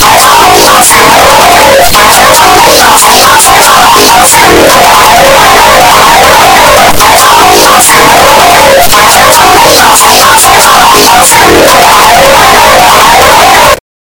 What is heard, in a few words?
cold error